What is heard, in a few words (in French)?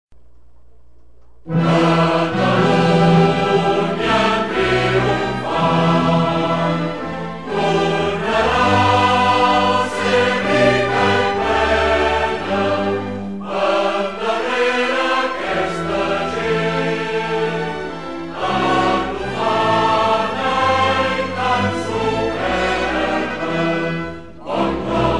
Hymne national catalan